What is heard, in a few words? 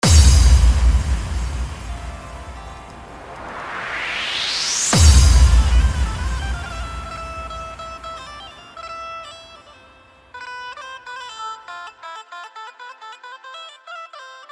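Bhakti Dj Remix Vibrate Mix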